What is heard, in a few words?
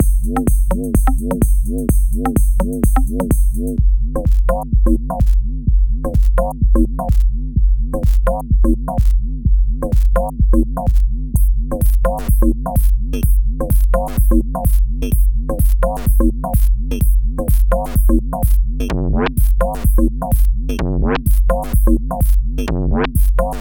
Minimal Beat; Sequential